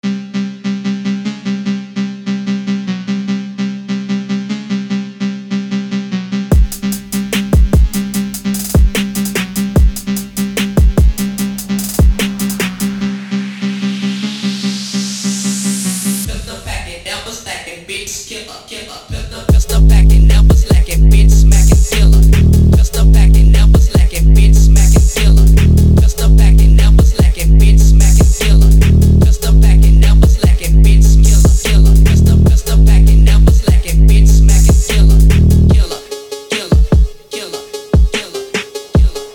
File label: phonk